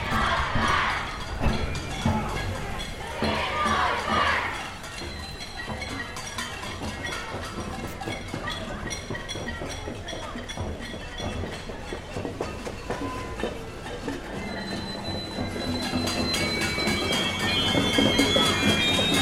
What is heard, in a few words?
Noise Parade; field-recordings